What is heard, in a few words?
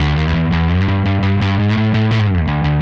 Static Bass